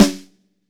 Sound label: SN, LUDWIG SNARE